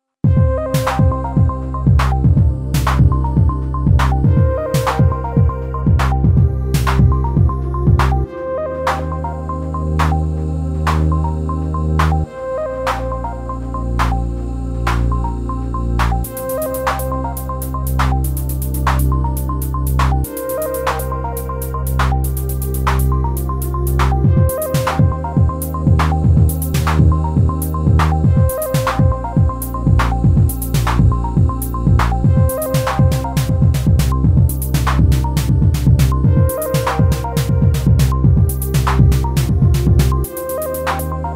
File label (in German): M8 Tracker Songbeispiel; Dirtywave